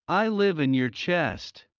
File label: Heart low; Classroom humanbody